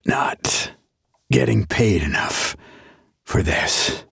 Death

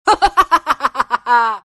luna laugh

